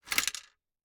Gumball machine, metal, glass, candy, switch, mechanism, rotate, dropping, wide; Eiravaein Works; Vaeyan IV